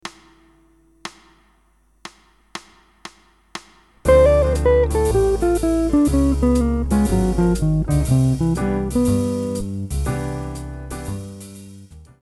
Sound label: guitar scale exercise example